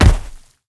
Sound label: step, procoptodon; zoochosis pack1